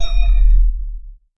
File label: low frequency ominous; occult